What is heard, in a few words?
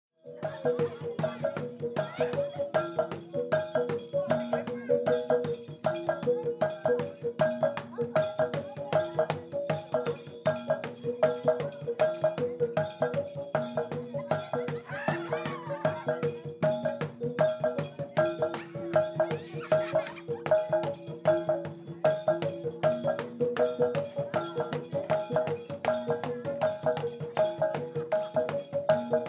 Gong Kedang